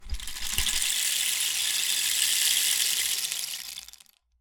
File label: Rain Stick, Percussion Samples